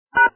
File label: DTMF pound